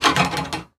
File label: Industry Tool Wire Poke Metal